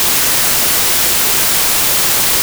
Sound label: white sound masker